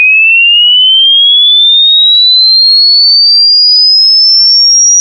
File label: 50000 Hz Sound Sms